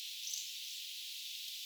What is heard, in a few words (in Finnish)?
mika rastaslaji onko kulorastaslintu